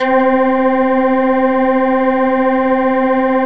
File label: Synths Note C